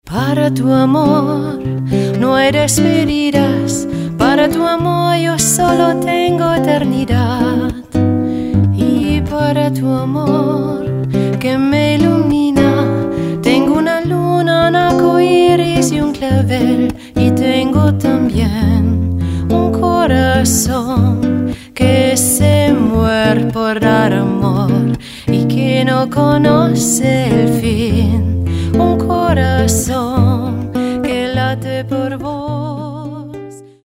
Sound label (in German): Gesangsprobe